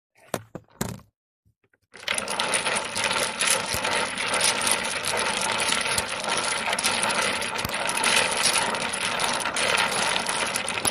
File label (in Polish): domino; Grudniowe Opowieści